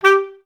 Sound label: BCS STAC G3; Best Service; Peter Siedlaczek's Advanced Orchestra